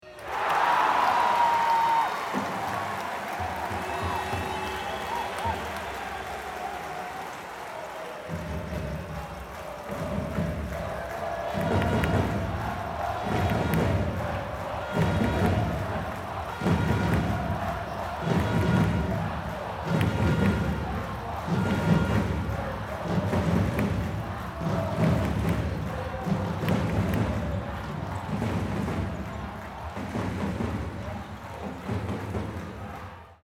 Handball Hallen Spiel Atmosphaere

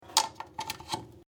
bad stecker

